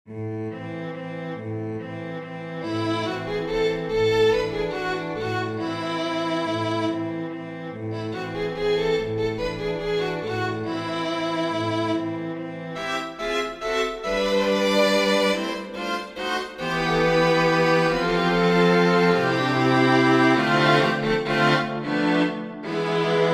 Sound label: string quartet; Waltz; Lyric Pieces